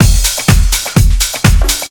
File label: drumloops 125; PS-28 Vocal House Samples; clonk4clonk